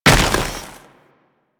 Combined Step Snow, Footsteps; Enemies; Warframe

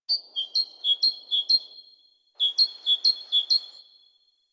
Tree Critters, Ambience Plains; Warframe; Eidolon Plains; One Offs

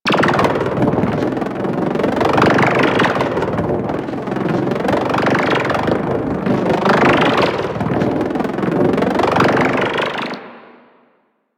Laser Skin Printer Lens Flare; Ambience; Props; Warframe; Entrati

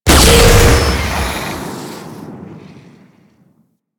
Rocket Launcher Fire 3D

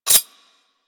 Whip Mech Rotate; Grineer

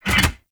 Gas City Mechanism Lock; Ambience; Props; Warframe; Corpus Gas City; Props Remaster

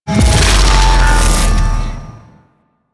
Warp Gate Open; Warframe; Sentient Devourer

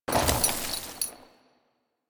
Horse Brakes Trot; Foley Actions; Warframe; Vehicles; Duviri

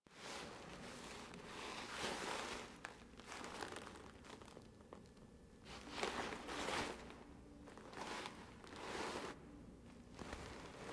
Orbiter Wake Up Foley Operator; Warframe; The War Within

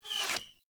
Hunter Bow Projectile By; Weapons; Enemy; Bows

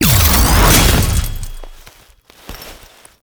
Ability Start; Quests; Veilbreaker; Kahl Abilities

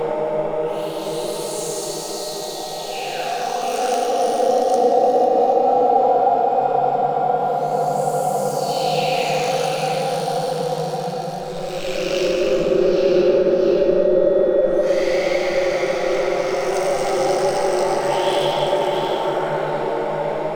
Angel Mist Loop; Warframe; Missions; Zariman; Zariman Assassinate

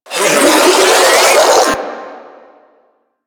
Spin Scream; Enemies; Abilities

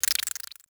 Critter Scatter Clicky; Ambience; Misc Outdoor; Warframe; Infested Eris

